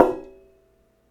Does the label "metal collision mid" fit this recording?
Yes